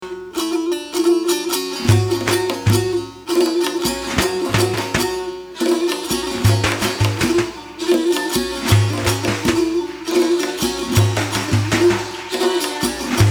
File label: Folk Music